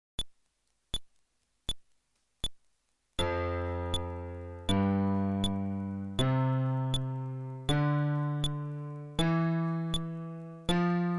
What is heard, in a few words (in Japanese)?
フレットレス (テンポ80)